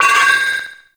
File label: Vocal Pain; Warframe; Sentients; Sentient Trooper